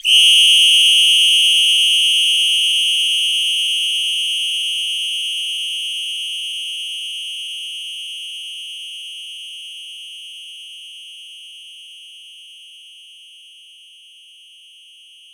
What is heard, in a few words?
Whistle Long, percussion; Roland BK-5